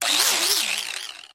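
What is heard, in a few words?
chainsaw ringing sharp cartoonish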